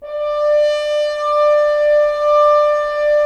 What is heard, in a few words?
Solo F.Horn, Roland LA Composer; Roland - LA Composer vol. 4